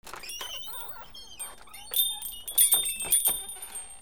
DOORS

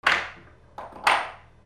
GARAGE

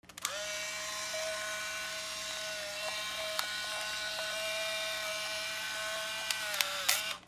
CAMERAS

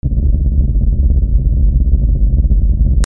AMBIENCE

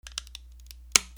HOUSE

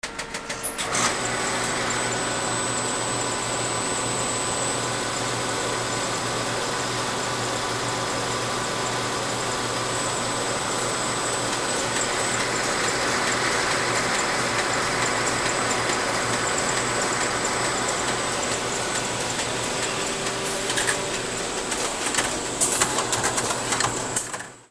OFFICE

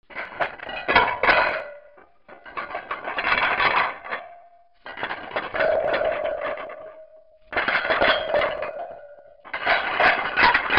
BANGS

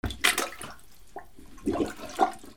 BATHROOM

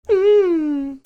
CARTOON

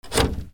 KITCHEN

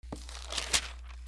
PAPER

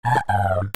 SCIFI

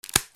BREAKING

